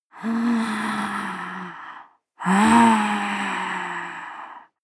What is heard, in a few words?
breath female moaning breath angry soft